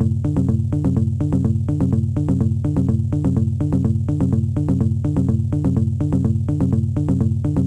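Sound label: bass 125 bpm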